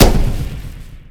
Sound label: mushroom poof